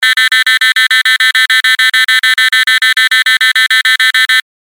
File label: sound earthquake maneuver